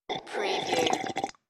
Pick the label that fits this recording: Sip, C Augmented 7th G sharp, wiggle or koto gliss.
Sip